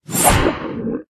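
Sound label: necromancer attack middle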